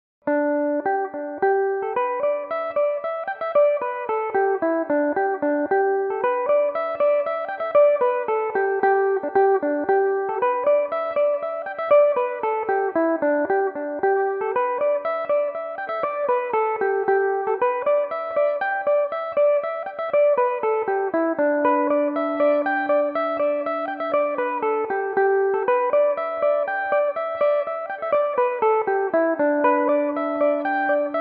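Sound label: polka G major belmuse, irish mandolin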